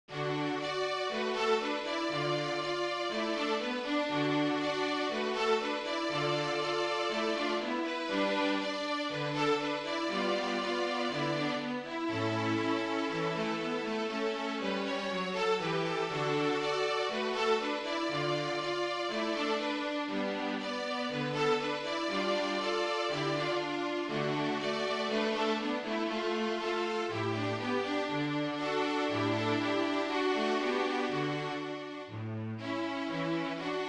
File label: Strings